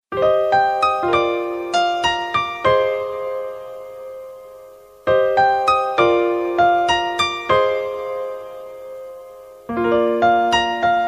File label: Classicaly Slow